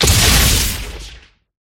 golpe arcano

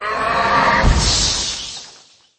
caida

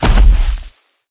caida

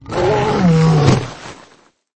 caida

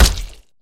pelea

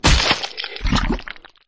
caida

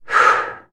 picar extraer nada

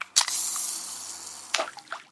pescar lanzar

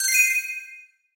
picar encontrar veta

